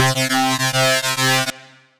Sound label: Lead, Synth Rhythmic; Pine Straw; Ableton; Factory Packs; Build and Drop